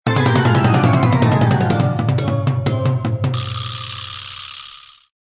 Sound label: game over; burger time